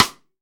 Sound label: METAL RIM, RIM SHOT